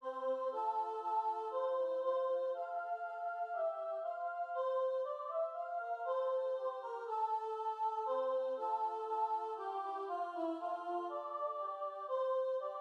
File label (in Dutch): Hoor de englen zingen sopraan tegenstem